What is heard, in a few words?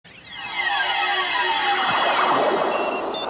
evil door opening